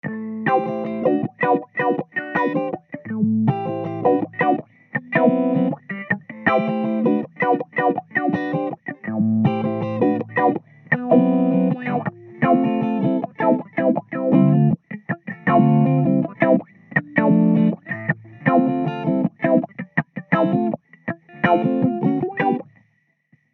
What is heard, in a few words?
Am 160 GTR RIFF